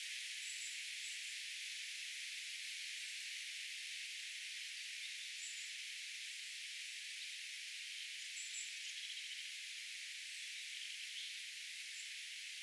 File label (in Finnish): onko tuo rautiainen